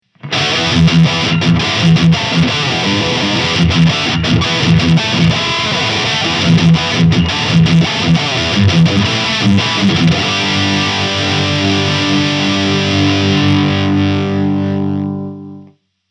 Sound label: Chug High Gain; Solar Guitars